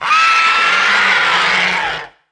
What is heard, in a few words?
devour life force; DAoC; Toa